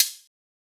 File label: hat snap, Closed Hat, Drum One Shots; SD MIDNIGHT Sample Pack; Slate